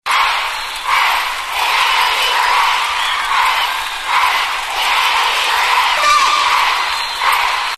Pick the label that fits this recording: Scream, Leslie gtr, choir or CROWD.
CROWD